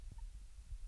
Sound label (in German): mucker, octodons